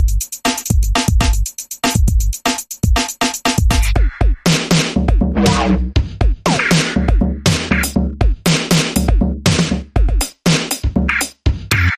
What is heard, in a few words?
Wow Percussion Bumper Reversed